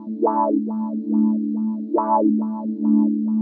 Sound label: TECHNO PAD 140; Keys